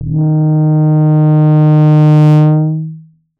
cresc

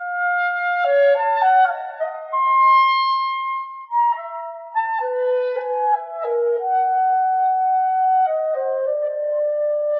trio synth inst; oracle

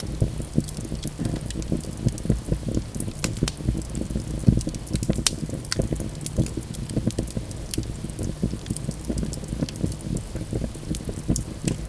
fire

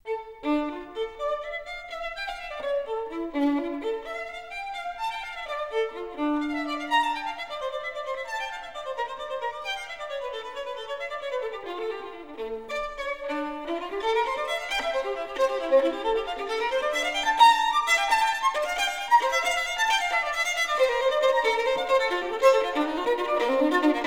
violin; bach

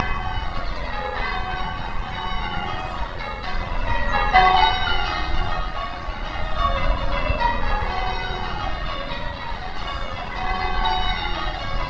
bells

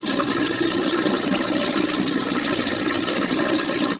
sink

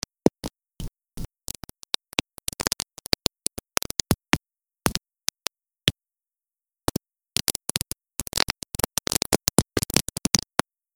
dry; reverbs

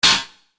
SPACE FX; ecrins; Mars

